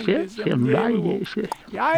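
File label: Music Village Calm Inside; from dust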